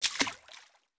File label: FS Water, footsteps